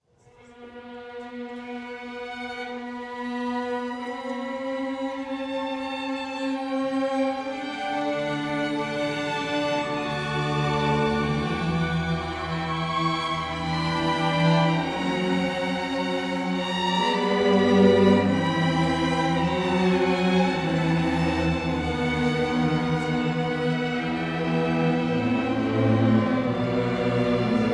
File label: Concerto In D Major For Four Violins III Grave